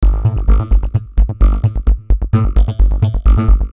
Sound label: bass